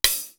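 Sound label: dance hat, cymbal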